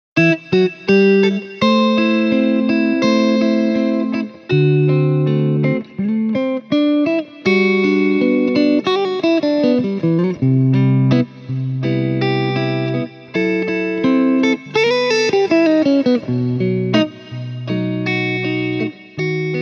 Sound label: neck mix, pickups